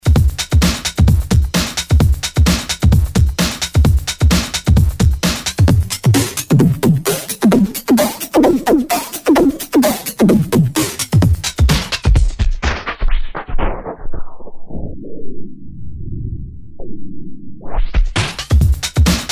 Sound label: Loop Slide